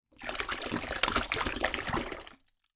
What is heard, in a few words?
plastic bottle tip pour water into kitchen sink bowl